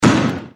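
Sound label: Fire Wrks, fireworks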